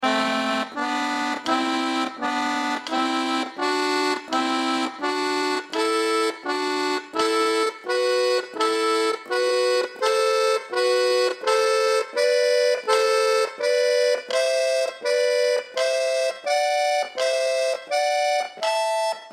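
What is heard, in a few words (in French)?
gamme synthetique sol tierce simult lent; Gamme synthétique de SOL en tiré par tierces simultanées; Par tiéres simultanées lent par 3 et tout en tiré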